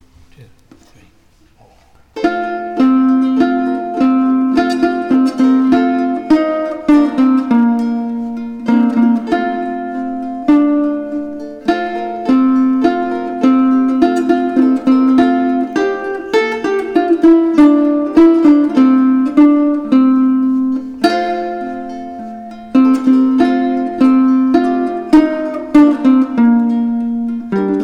Uke Baritone

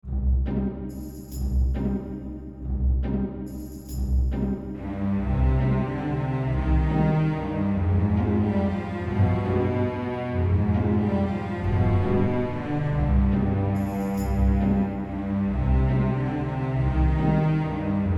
Waltz

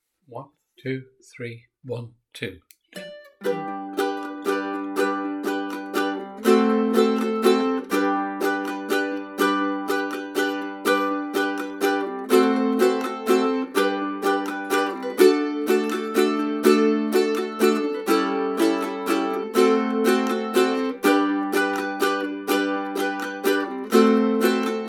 Strum; Come Hasten Ye Shepherds

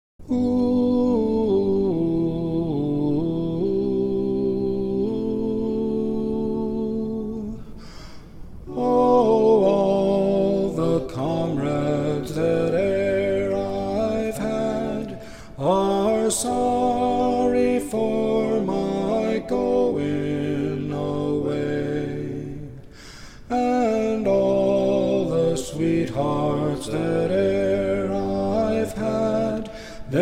Lead; The Parting Glass

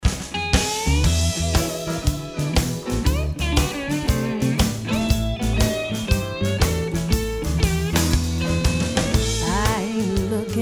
SONG

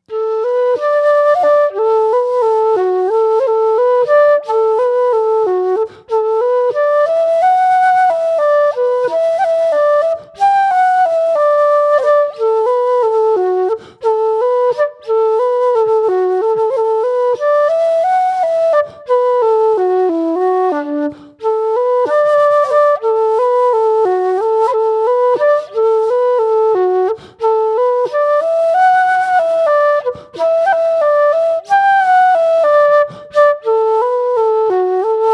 Slowest; Merry Blacksmith

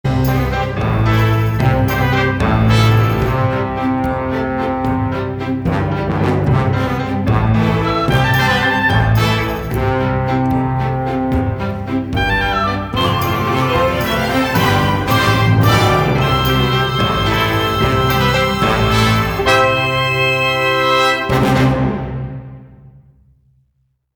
Fathoms with out words

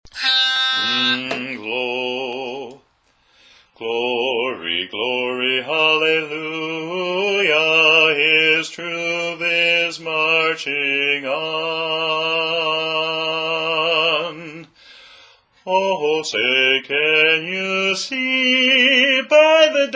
The Star Spangled Banner - Bass

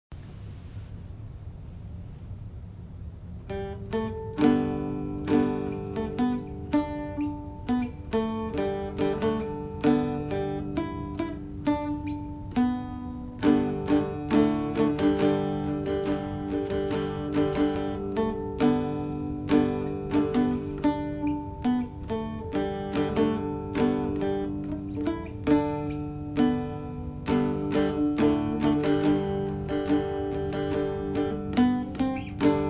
license plate guitar

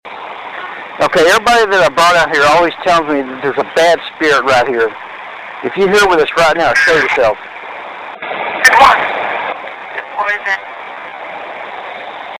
ghost box

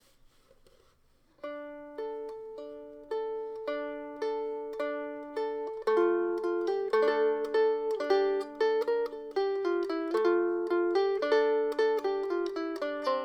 gittern

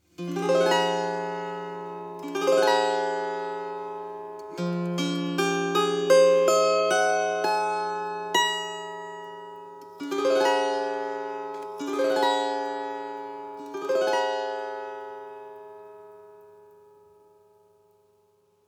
sacred scale harp

